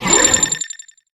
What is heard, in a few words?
Templar Sword of Fury; Inotia 3